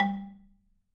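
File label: G2, Marimba, dead note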